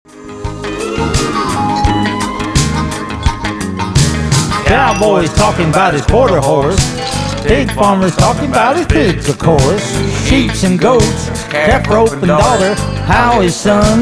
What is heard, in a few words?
Country Rap; Olde Lampligher